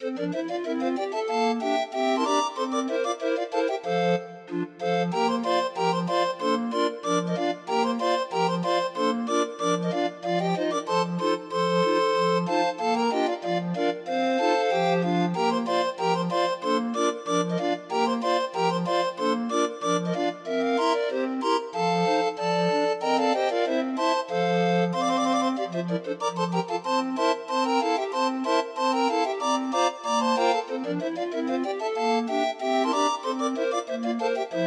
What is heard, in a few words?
Piccolo Polka, Grind Organ; Demo of 20 note MIDI file